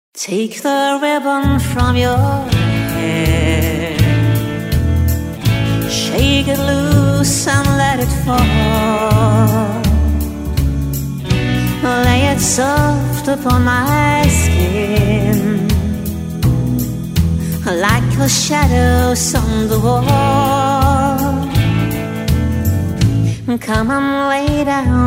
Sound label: country parlor